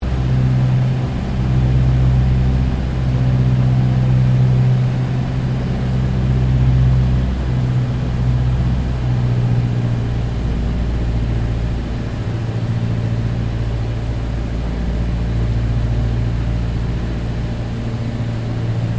AIRCRAFT LOCKHEED HUDSON LANDING INTERIOR, twin engine light bomber; 1941; Airplanes (Sound Effects:Vehicles)